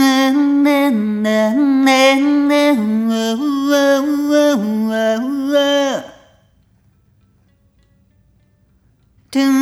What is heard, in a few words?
Rhythmic A